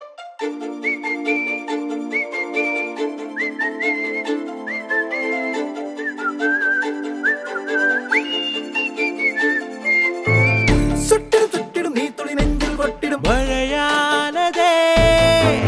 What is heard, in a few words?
Whistler BGM Ringtone